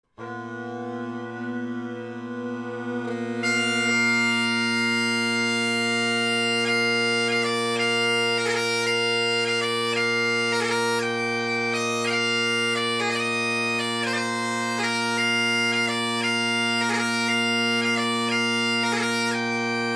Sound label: King of Laois and The Thornton jig, bagpipe, GHB; (probably 1960s) Sinclair bagpipes; Ezeedrone tenor reeds